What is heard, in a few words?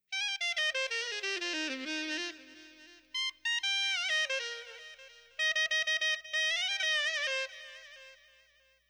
Horn